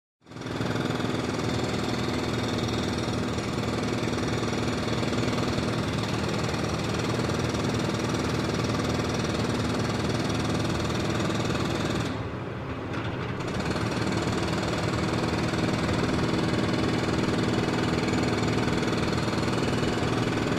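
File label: destruction of a construction site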